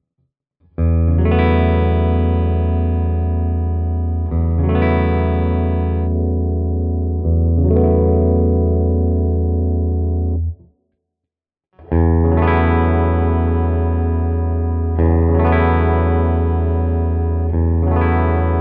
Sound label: Hagstroem clean Tone Poti